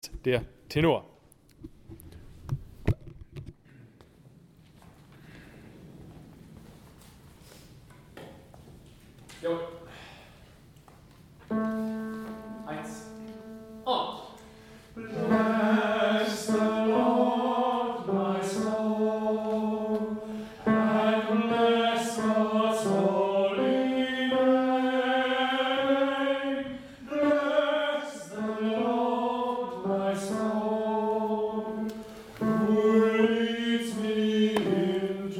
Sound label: Bless the Lord TENOR